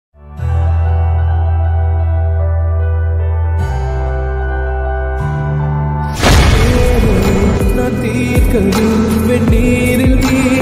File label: lofi, Tamil ringtone